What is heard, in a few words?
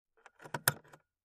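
Nissan Sentra interior point of view as key is inserted into ignition, and lighter is pushed in and out; Vehicles, Compact Ignition, Car Lighter, Cigarette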